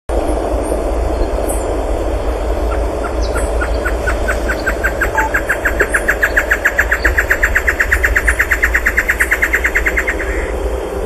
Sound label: Taraba major; PN Calilegua